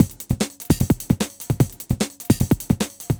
ELECTRO ACOUSTIC KIT MELT, PROCESSED ACOUSTIC DRUM KIT; Sonic Foundry (Sony Creative Software); Vir Unis Infusion